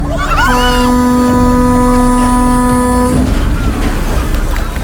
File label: Big Ship Ringtone